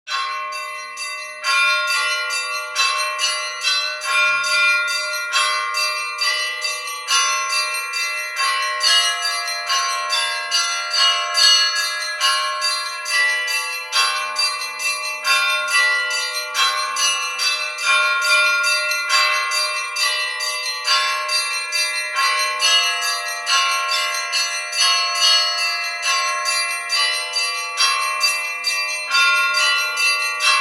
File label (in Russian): ЗВОН НИКОЛАЕВСКИЙ